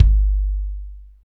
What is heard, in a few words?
kick, Hip-Hop Rap; Northstar; Drumscapes Roland; Kicks